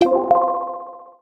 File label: all players found